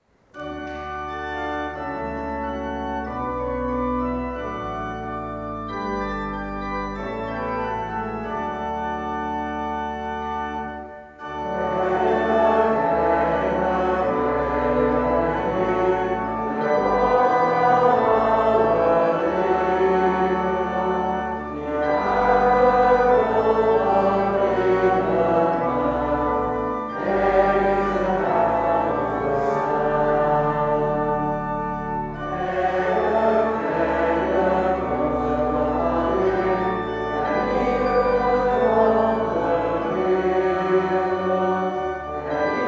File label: Trombone; Heilig Heilig